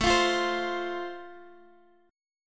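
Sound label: A Minor Sharp 5th, Guitar DADGAD, chord strum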